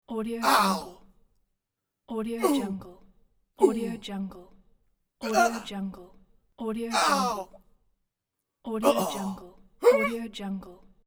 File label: male pain shouts pack